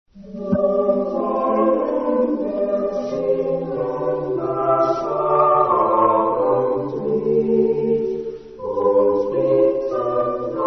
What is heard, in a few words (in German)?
Abendlied Singkr; Leutkirch